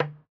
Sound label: Fish Sword Hit